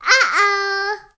baby uh oh; TamTamMicro.activity

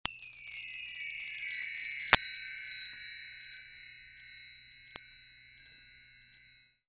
chimes; TamTamMicro.activity

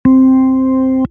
harmonium; TamTamMicro.activity

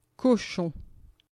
pig; FoodChain.activity; fr

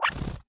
ounk; TamTamMicro

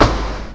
slap; TamTamMicro

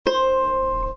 koto; TamTamMicro

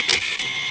camera; TamTamMicro.activity

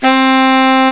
saxo; TamTamMicro.activity

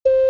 ocarina; TamTamMicro

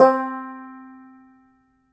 banjo; TamTamMicro.activity

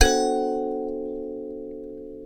win; MazeWeb.activity

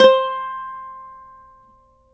mando; TamTamMicro.activity

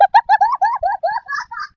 baby laugh; TamTamMicro.activity

